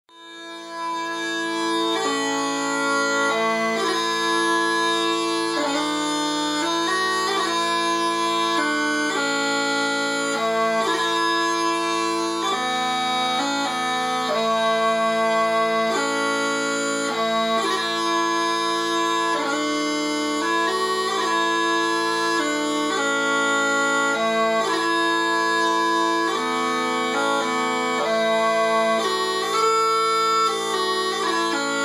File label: Smallpipes; Bagpipes; Drummers Lost